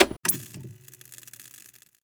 tv on; Singles Flirt Up Your Life

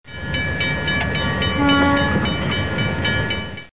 railway; Simon and Schuster Interactive

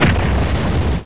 kaboom

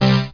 enter; td4demo

